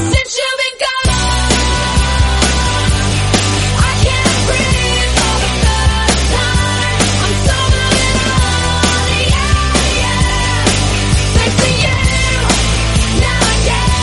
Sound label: REDRUM 808 131bpm